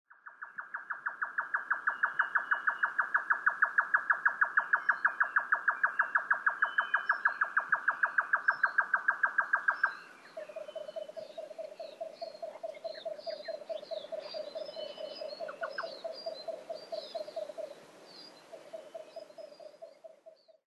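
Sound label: grey nightjar, osado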